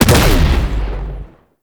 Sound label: Alt Fire Explosion; Weapons; Warframe; Corpus; Tenet Quanta